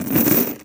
Steel Chain Being Dropped And Dragged On A Cardboard Box